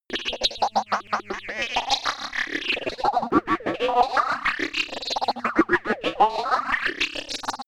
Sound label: unknown species